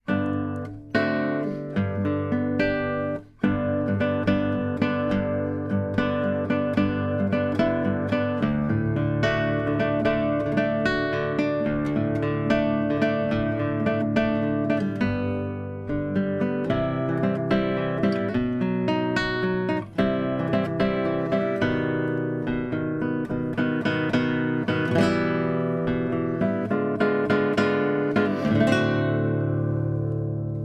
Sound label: Guitar; Assassin's Creed Syndicate